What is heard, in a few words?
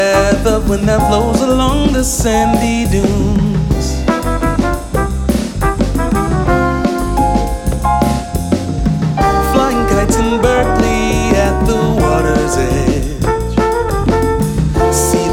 Bossa